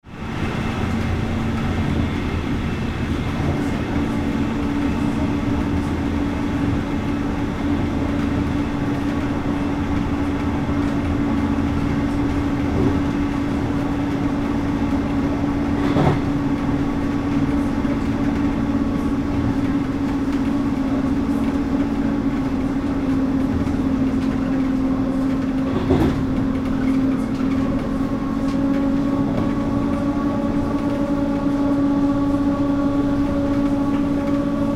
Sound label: regional train braking cabin inside